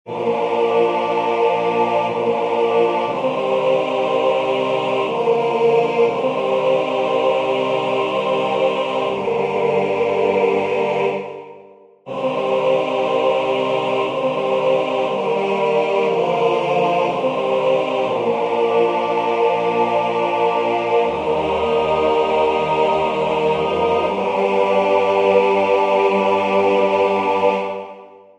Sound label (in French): Hommes; Graduale